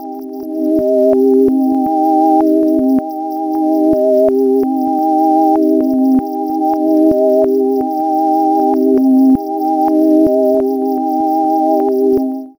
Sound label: Music Box; Zero-G Ambiosis; Atmospheres